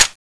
clip out, weapons; vulcanus5

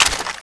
m60e4 clip out; weapons; cstrike

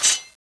knife draw; weapons; tknifeex2

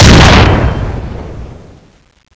jetpack shoot

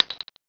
shell; weapons; blockar1

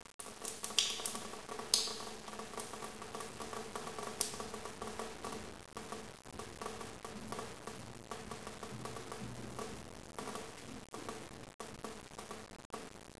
water drips; zp pollution v2

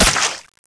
elephant

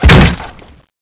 speargun wood; weapons; cstrike

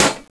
clip out; airburster

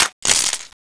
rifle clip out

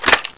l85 foley, weapons; cstrike

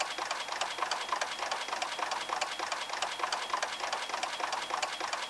jetpack fly

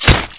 hit

